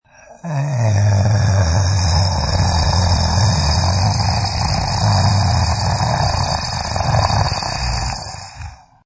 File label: Monster Animal Groan Long; Monster, fantasy creature breathing snarl: Moaning, tired breath of large dog like alien monster or fantasy creature snarlin